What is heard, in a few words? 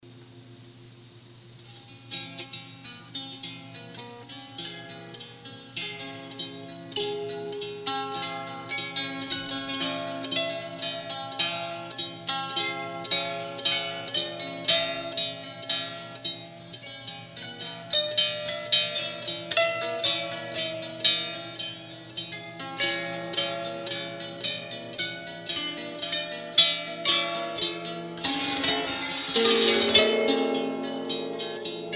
dulcimer finger pick slide